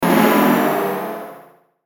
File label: Hit Warble; Hit Warble Ascend Descend; Warbles; Blastwave